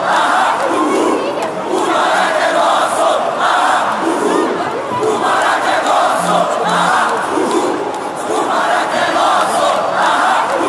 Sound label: o maraca e nosso, chants